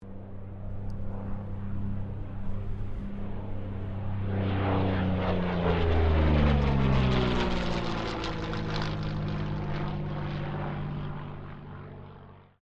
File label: AIRCRAFT P-40 KITTYHAWK PASS WIND STEREO; P-40 Kittyhawk / Warhawk / Tomahawk; Airplanes; Vehicles